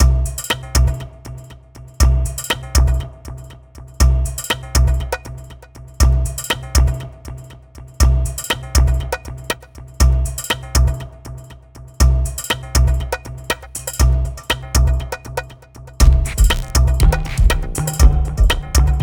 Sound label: Thunder Spring and Waterphone